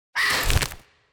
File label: Unstable Bat Wood Item Pick Up; TANK; 3Souls